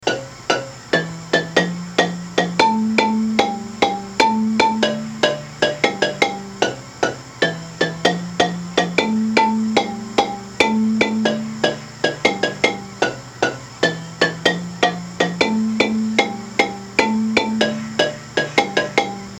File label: bass bouncy, marimba; manhanga